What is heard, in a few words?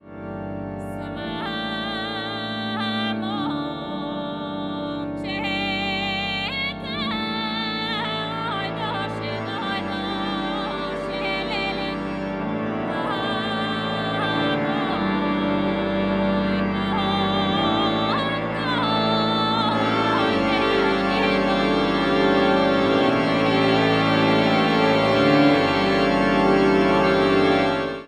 bulg organ